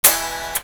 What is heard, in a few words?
new strings, ukulele